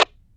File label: Enemy Static; Warframe; Lasrian; Battle Barks